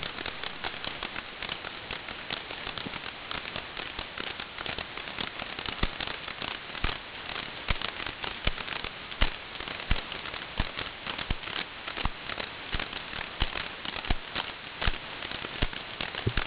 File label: clicks, whale songs